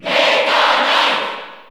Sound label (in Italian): Cheer Italian; Meta Knight; SSB4 SSBU